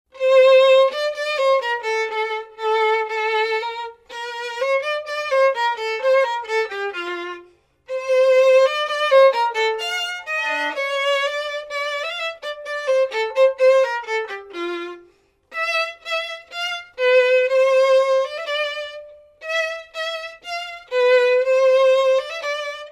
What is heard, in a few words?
Solo; Mozart